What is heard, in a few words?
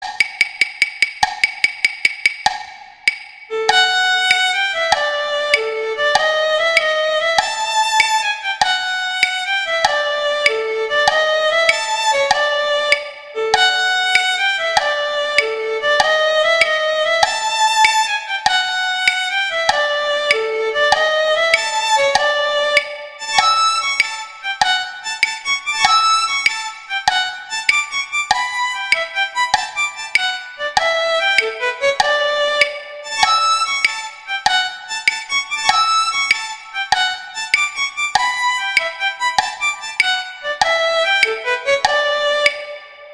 Allegro Guitar; Paganini; Sonatas Op1 Vl Gu; Sonata No 3